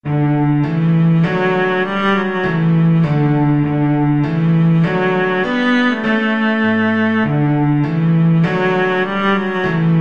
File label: baloo lammy, cello